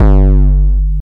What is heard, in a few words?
HD BD, Hard & Loud Techno